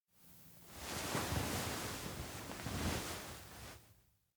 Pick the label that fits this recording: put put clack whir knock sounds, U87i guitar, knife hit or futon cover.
futon cover